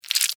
Insect Claw Wet, Insect, Claw, Wet, Juicy, Snap, Movement, Bug; Sound Effects:Animals:Insects; Insect Claws; Blastwave